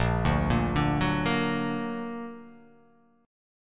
Bb Augmented Bb; arpeggiated; JGuitar

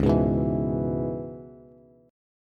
Db Minor 7th, Bass; strummed

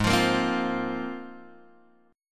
G sharp 6th Add 9th G sharp; G#6add9 Chord {4 X 3 3 1 1} strummed

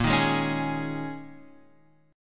Eb 7th Suspended 4th, Bb

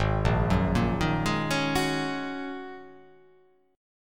Ab 7th Suspended 2nd Suspended 4th Ab down; Ab7sus2sus4 arpeggiated

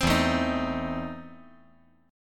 E Major 7th Suspended 4th Sharp 5th

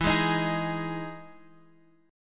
E Major 7th E, chord

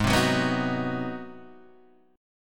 G sharp 7th Sharp 9th, Guitar, Open G; down; chord shape

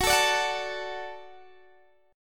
F sharp Minor Sharp 5th F sharp Guitar Open D

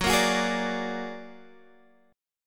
Bb Augmented 7th Gb

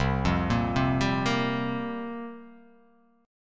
C 7th Suspended 4th, chord

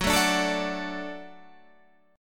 F sharp Minor Sharp 5th F sharp Guitar Standard; strummed; JGuitar

